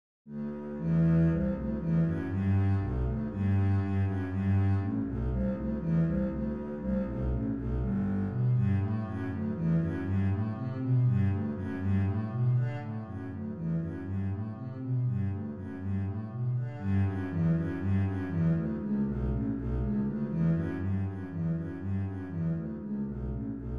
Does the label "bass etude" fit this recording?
Yes